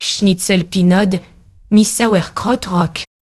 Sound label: jingle